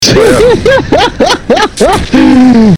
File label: serbian laugh